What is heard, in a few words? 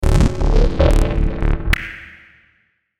Digital Musical Warble Click, Digital Glitch Fuzz Crackle; glitches; Blastwave